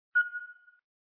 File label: menu button beep; Coffee Shop